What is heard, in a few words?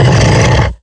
Pound Attack